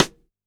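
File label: Snare Stick, drum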